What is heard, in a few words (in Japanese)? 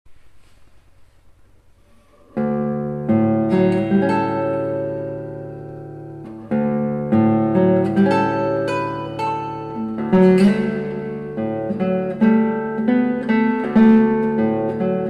フリアフロリダ, ギター